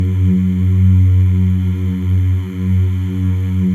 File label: MALE MMS, Symphony of Voices; Spectrasonics